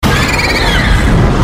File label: roar; mothra